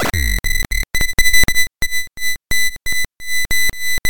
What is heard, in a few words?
tones